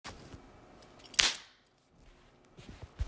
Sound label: Belt Whip